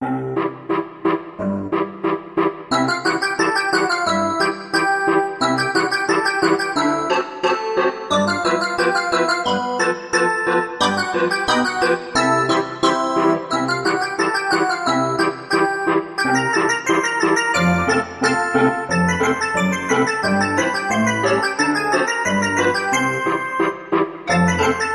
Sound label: Organ Circus Theme